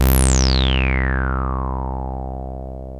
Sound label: TB BASS; TB-303